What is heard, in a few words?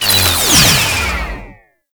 Mine Disarmed; Tau; Twelve Minute War